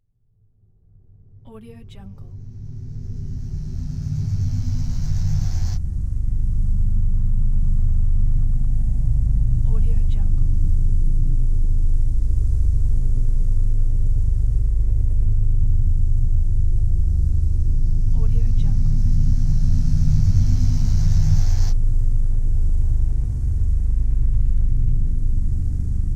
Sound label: drone ambience